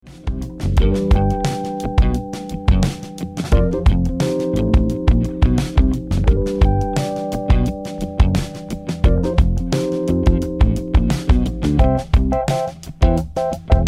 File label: 87BPM C